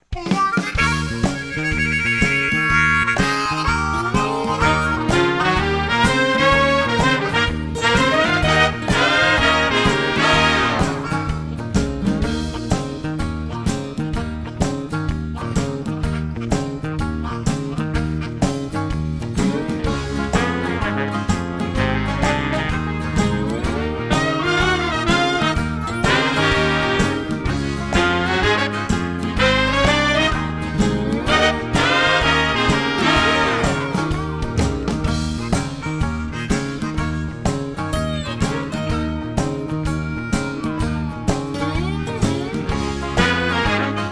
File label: Instrumental Only